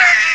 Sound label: aaah; ST-XX Amiga Soundtracker Sample Packs